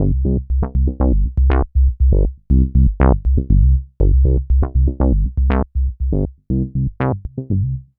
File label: Bassline, Prototechno